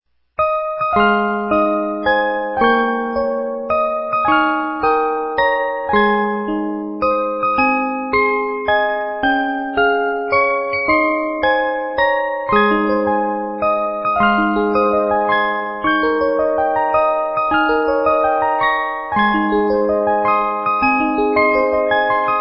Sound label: Happy Birthday, 30 Note